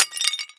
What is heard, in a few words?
pottery impact, glass; physics